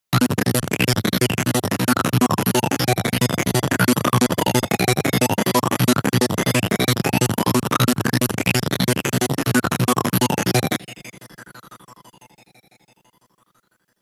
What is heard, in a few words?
Techno Trance Arp